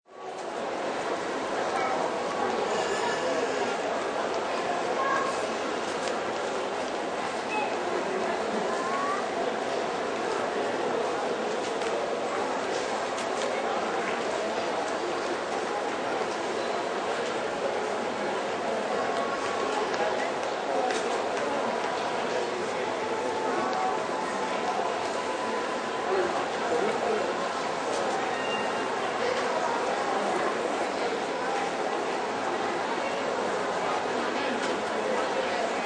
Shopping Mall Ambience, Shopping mall, light crowd.; Close to floor level, with footsteps, faint talking and people walki; Stores And Mall